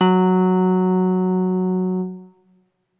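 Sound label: deep F#4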